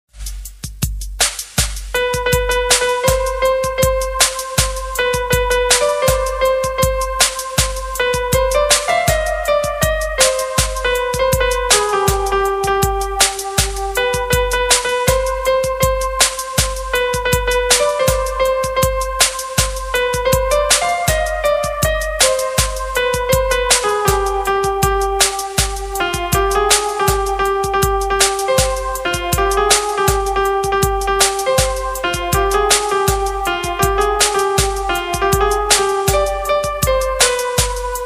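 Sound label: love song background music love background music song music; Amaran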